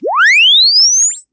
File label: Press Two Sides Chirp; Samsung 4 Recordings